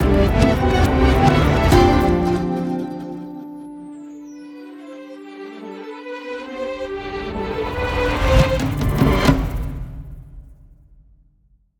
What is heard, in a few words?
Transition; entwined; ps vita